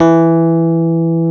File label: E 0, Electric Pianos; Wizoo Electric Pianos